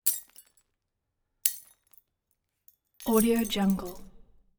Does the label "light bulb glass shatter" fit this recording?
Yes